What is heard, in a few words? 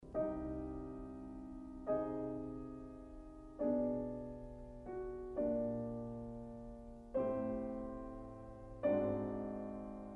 prelude in c minor piano; chopin